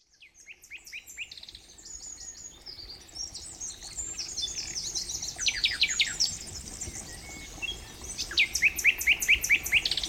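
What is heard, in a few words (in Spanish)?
Paisaje sonoro Hoz del Huécar Cuenca España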